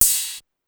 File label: Ride 808, Pitched Up; Clavia DDrum-AT